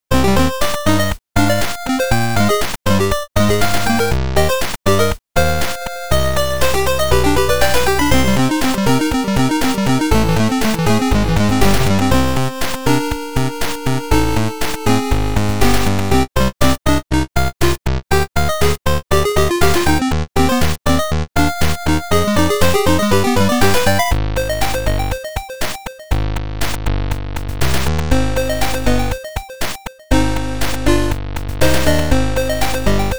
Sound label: 8-bit Internets Levels; Super Meat Boy; Digital Special Edition Soundtrack